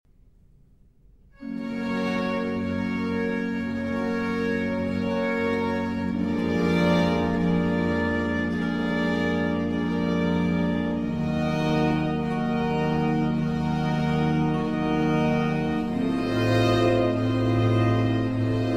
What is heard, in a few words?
Violin Concerto in G Major